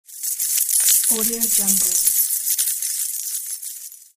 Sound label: jingling keys